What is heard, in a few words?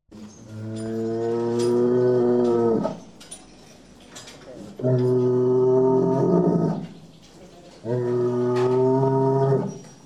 Bull; SOUND EFFECTS1